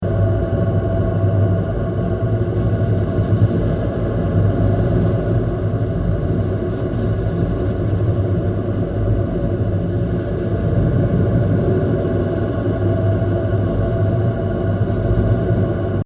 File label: Space Ambience With Moving Objects 120bpm